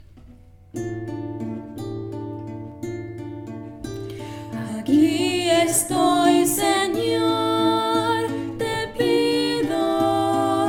llevame al desierto VOZ

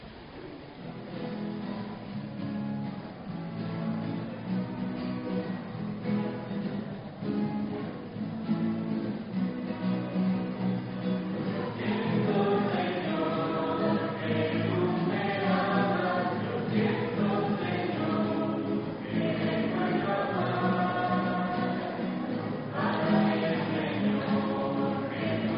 HABLAME coro; coro de la Lomas; misa 20 de febrero 2022 domingo vii del tiempo ordinario

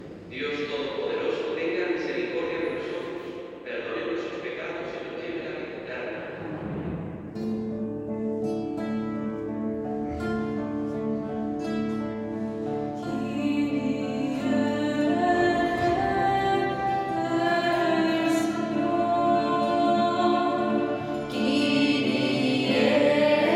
KYRIE GREGORIANO EN MISA

